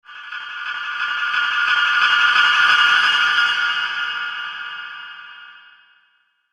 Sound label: Gathering Spirits, ghost sound effect; horror; Andrew Fleming